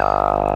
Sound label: stop robot, Synths; Notes